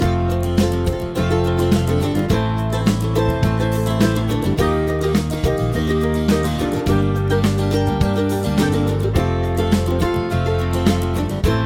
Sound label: chord prog with mixture